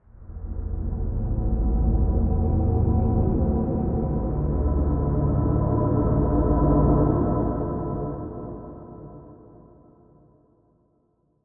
Creepy; Sprunki Incredibox Character Wiki; Black; Incredibox Mod

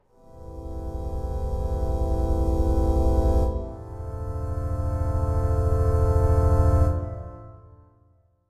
Horror Loop Slow organ; sprunki incredibox

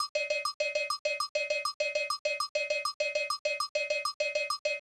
Goofy ahh thing; Brud; sprunki incredibox

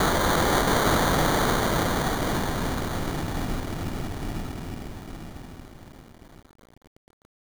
horror Wind up; sprunki incredibox

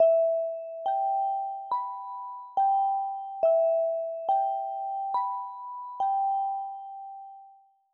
horror sky Music; sprunki incredibox

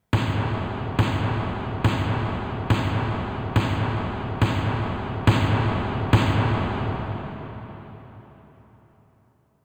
horror Snare; Raddy

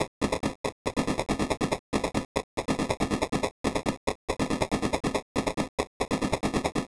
horror Weird sound; Brud(Brown); Incredibox mod Sprunki

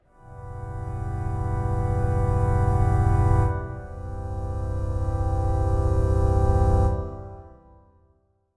Horror Loop Slow organ; sprunki incredibox

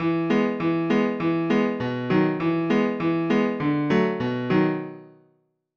Old Normal Loop First phase piano; sprunki incredibox; Mrsun

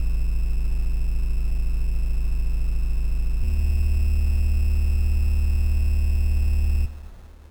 Horror Bass

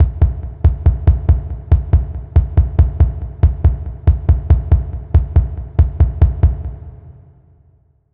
horror Kick; Oren

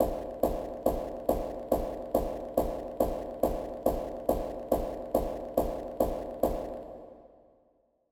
Horror Knock knock; Sprunki Incredibox Character Wiki; Grey Clukr; Incredibox mod Sprunki

